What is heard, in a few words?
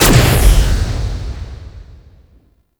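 Projectile Explosion; Unairu Sundering Dissipation; Warframe; Focus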